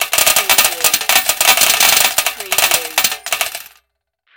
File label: Coin Slot Pay Out